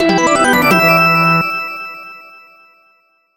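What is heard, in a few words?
Level Clear; super stone legacy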